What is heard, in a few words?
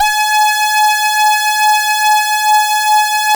saw res dry, synth; Keith Emerson Signature Series